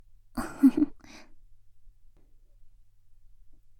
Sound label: laugh, ASMR; Garden; WithRin-Nazo01